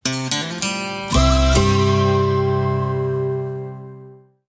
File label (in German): Soundlogo; Brimi